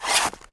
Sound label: draw crossbow; Mount&Blade Warband